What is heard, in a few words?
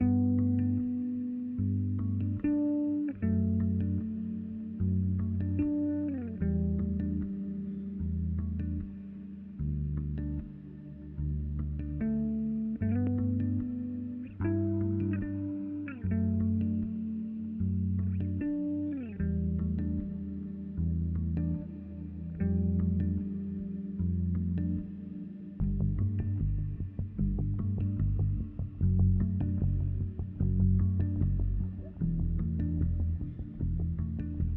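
groove snippet melody textures, electric bass; Groove and Melody; This snippet demonstrates all of the above elements combined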